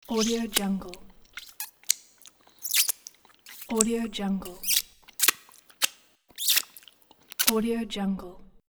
finger licking

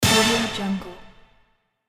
brass quiz show hit

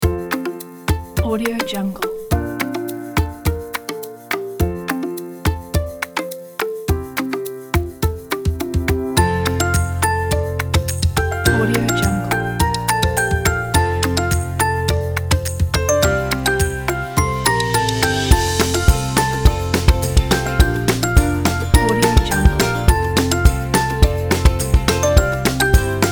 funny kids

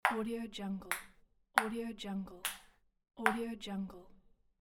ping pong

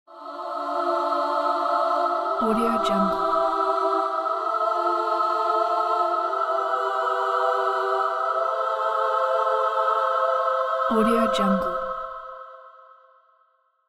angel choir ascending

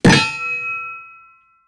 comic metal; prosoundfx

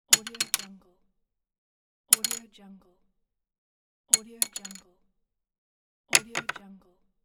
pencil fall

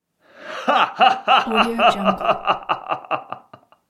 villain laughter

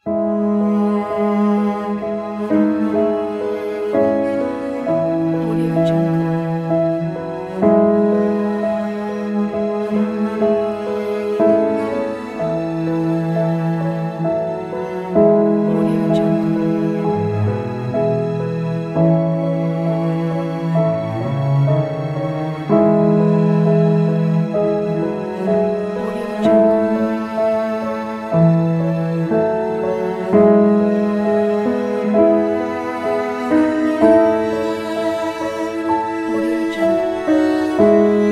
emotional orchestra

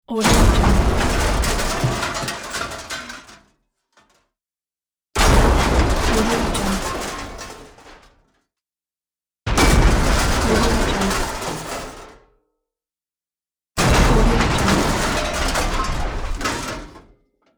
metal impact

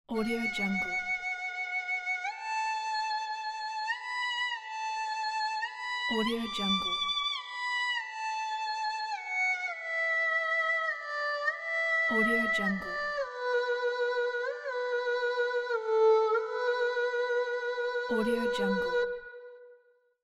chinese erhu melody

